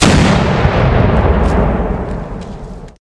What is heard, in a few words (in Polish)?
wybuch, armata z oddali